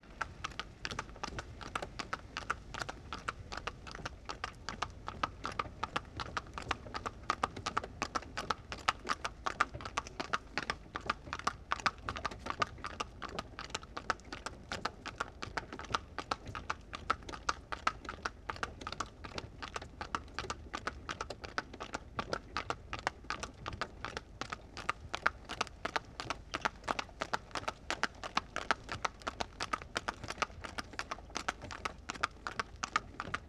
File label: Horse & Cart Constant; Period Vehicles